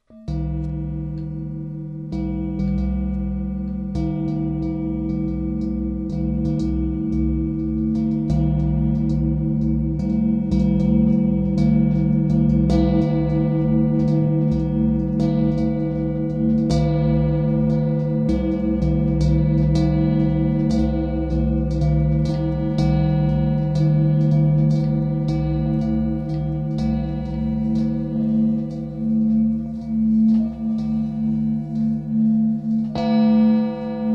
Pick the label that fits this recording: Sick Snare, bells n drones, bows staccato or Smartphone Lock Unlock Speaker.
bells n drones